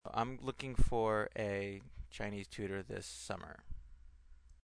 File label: Vocalist Singing